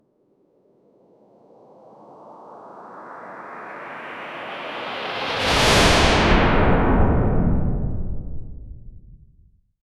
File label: bulid up air strike